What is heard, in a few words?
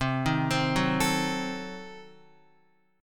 C Diminished 7th C, Guitar 4F,4A,5C,5G,5C,6E; arpeggiated; JGuitar